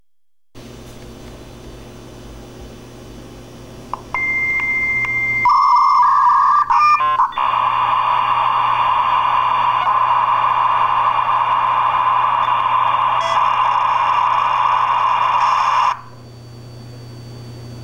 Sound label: Modem